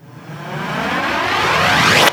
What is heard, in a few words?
VFX Short Fills & Reverse